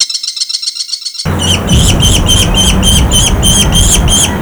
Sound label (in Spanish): falconcito, "American Kestrel" Falco sparverius; Tamaño: 23-30 cm / Envergadura: 51-61 cm / Peso: machos 80-143 g, hembras / 84-165 g; Los Colores que Cantan; Las Aves de Puerto Rico en Fotografías